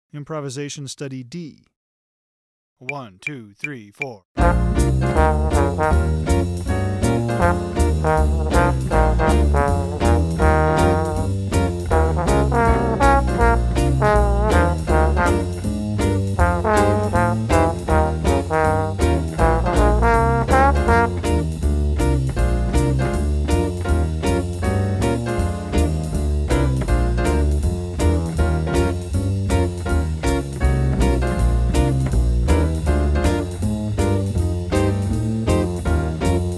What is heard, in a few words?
Blues improv D; Blues at Frog Bottom